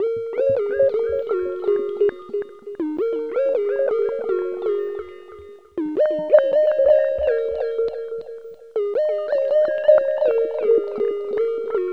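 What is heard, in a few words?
synth, Drum & Bass; Best Service ProSamples vol.03 - Drum & Bass 1; AKAI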